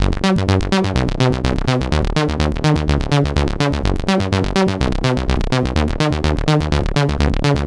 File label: saw terror Cm 125, synth loop; Zero-G; Soundclash; Nu Dance Electro